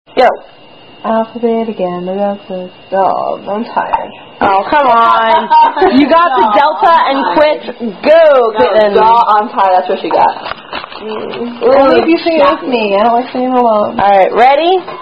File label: Greek Alphabet Song Fail